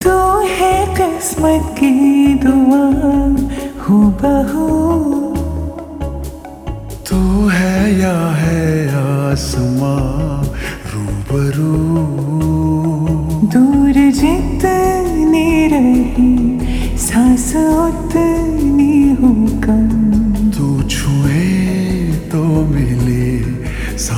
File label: Slow Reverb Version Ringtone